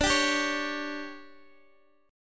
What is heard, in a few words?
D Major 7th Suspended 4th, Guitar, DADGAD